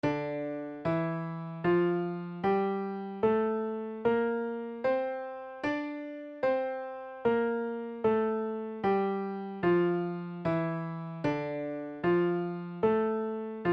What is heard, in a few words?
tuneup d minor; minor keys level 3